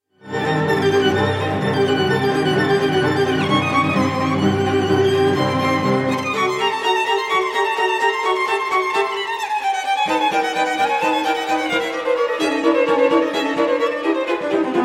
string quartet no 8 in c minor op 110 second movement; dmitry shostakovich